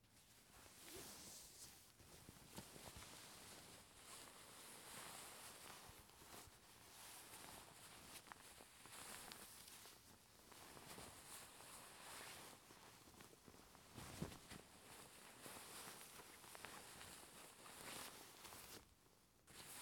Sheets Moving Folding

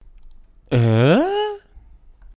"Ehhh"

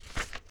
Paper

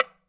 Metronom Klack

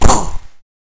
Shot

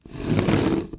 Scrape

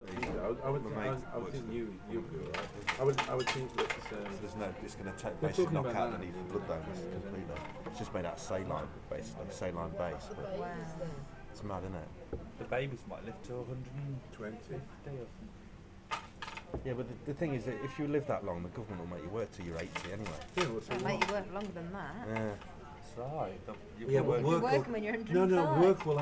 Family Meal Babbling

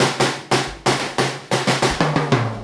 Snare Fill

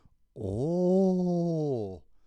Ohhohhh

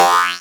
Cartoon Poing

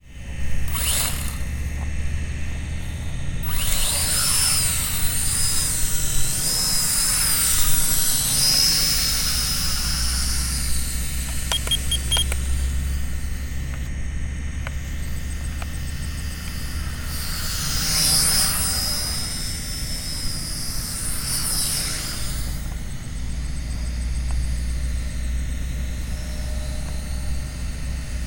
Take Off Flyby Land

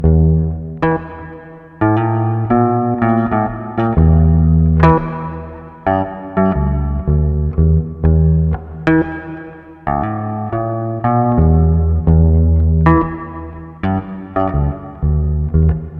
E Pop 120bpm

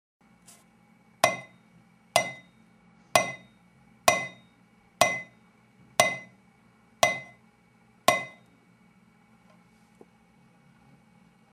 Metal Clink

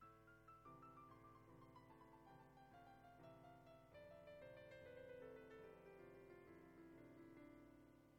Piano Stairs Down

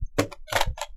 Dvd drop

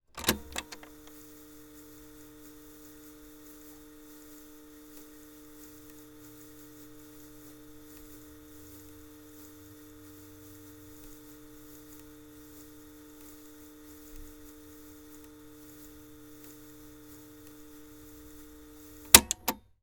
Cassette Tape Deck Start Stop Play Clicks

